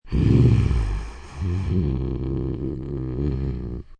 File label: Low Growl, Animal sounds, low rumble, growl (human voice); Miscellaneous Animal; VoiceBox